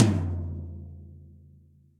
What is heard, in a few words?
Tom Floor SessionDry; Drum Hits; Standard Hits; Ableton; Factory Packs; Session Drums Studio